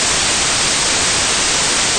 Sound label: white